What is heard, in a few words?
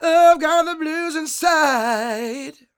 SING, Vocal Planet Blues Soul BLUESY